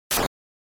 SNES RPG Attack Spear

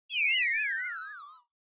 Onoma Negative Mid; オノマトペ ネガティブ

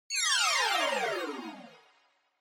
Scene Change Down

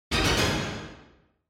Quiz Question Low

